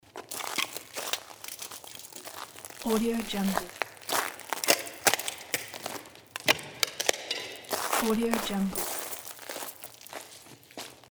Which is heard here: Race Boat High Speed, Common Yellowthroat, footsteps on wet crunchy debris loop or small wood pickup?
footsteps on wet crunchy debris loop